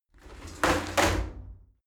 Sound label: shower slide door open heavy